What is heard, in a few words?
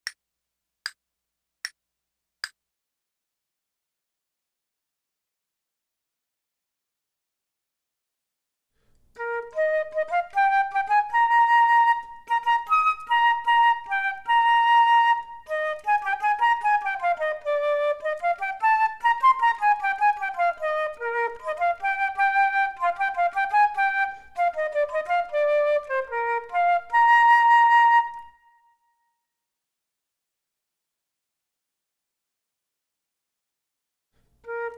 Duets flute; Selected Duets for Flute Podcast